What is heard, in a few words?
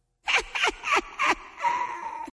Devil Laugh